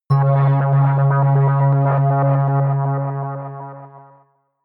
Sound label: synth hit rhythmic scatter, hits impacts; whooshes rises